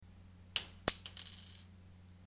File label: coin dropped on wooden floor